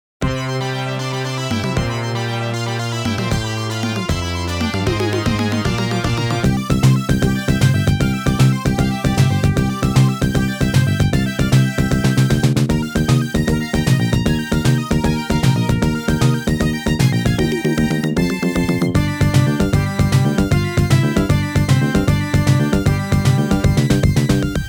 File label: 2nd Stage BGM; Salamander; arcade soundtrack; Fly High